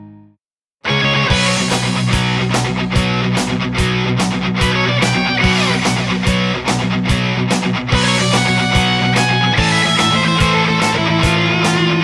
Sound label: rock theme